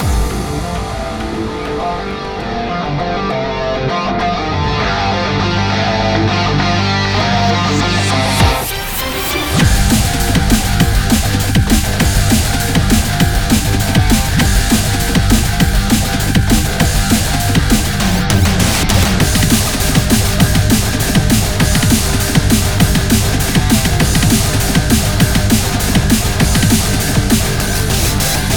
Instrumental; Uproar; Friday Night Funkin' Mind Games; PC mod gamerip

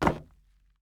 wood land

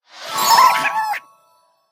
Teaparty Cagebird Jingle

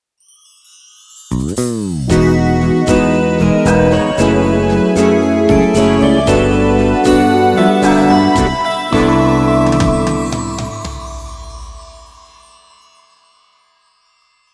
square intro; CrossCode; Switch PS4 Xbox One PC macOS Xbox Series X Linux; Rhombus

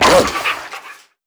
SFX hound death; From Shadows

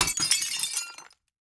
glass break

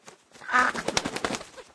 Goose Man Attack; Farm Mania 2

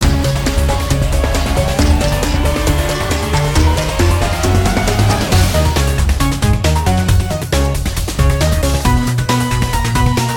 Music In Game Mixing; Calling All Mixels

